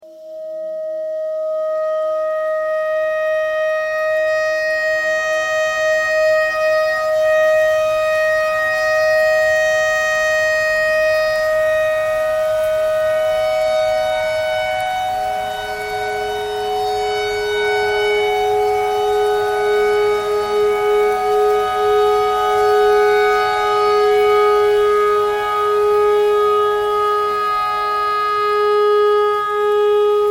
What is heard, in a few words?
tornado siren clarinet notes e thread simple